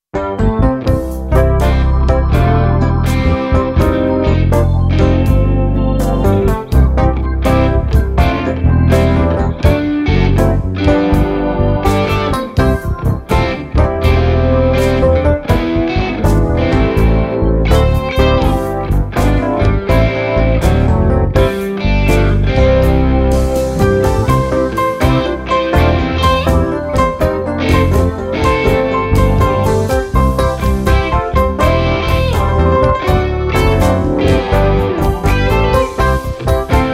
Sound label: Lock down blues